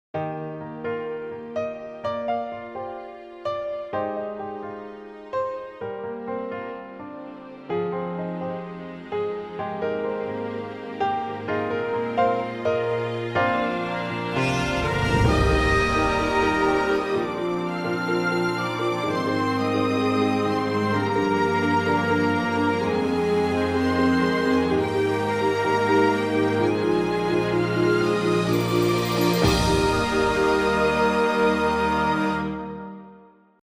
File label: French